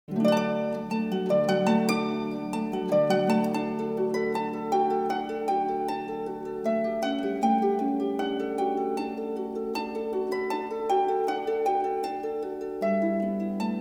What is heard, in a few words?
Nursery Rhyme Instrumental